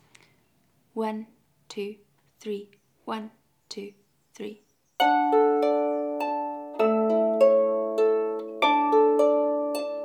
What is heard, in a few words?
full speed play through small harp